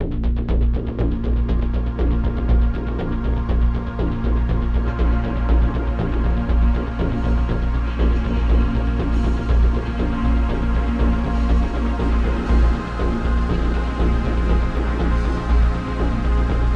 Pads Loop; Headlander

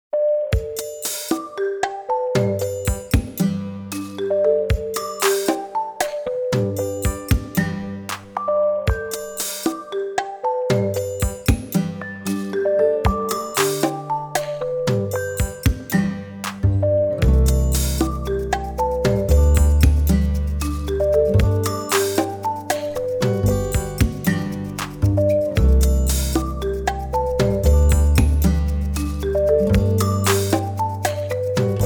Wood Ensemble; Hyperdimension Neptunia; Chou Jigen Game Neptune The Animation

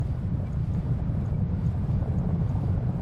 Sliding door

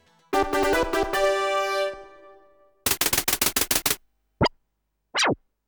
appears fanfare; Flash Point; Bloxeed

